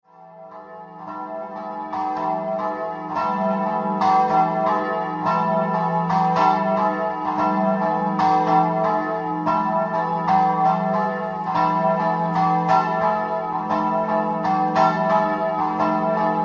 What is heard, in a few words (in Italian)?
MONTEFOSCA, campane